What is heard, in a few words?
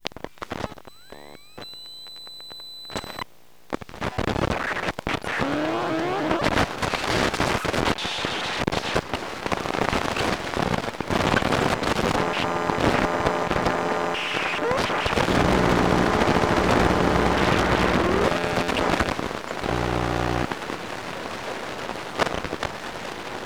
MBA booting to OSX, login, shut lid to powernap; EM Pickup